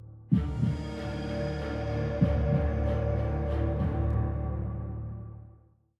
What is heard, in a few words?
190bpm; Legends of Pegasus; windows gamerip 2012